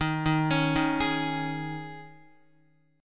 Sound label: Eb 7th Suspended 4th, Guitar; Eb7sus4; arpeggiated; x,6,3,6,3,4; JGuitar